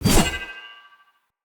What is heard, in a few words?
Long Sword Whoosh Heavy; Weapons; Player; Swords; Pothia